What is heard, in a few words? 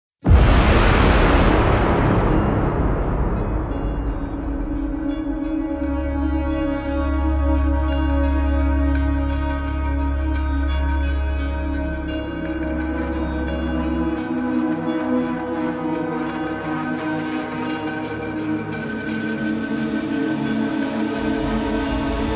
Trailer Brass; Musical Sampling